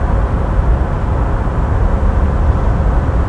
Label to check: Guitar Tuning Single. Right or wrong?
Wrong